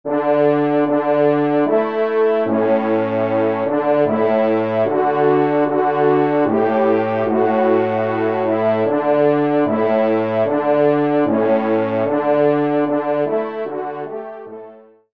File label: God Save The Qeen